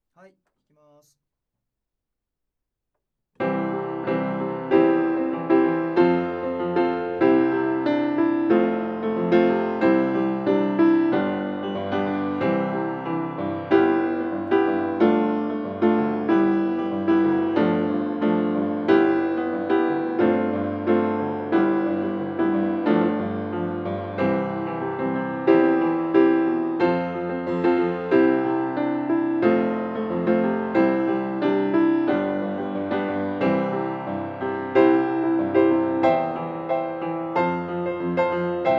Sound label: Piano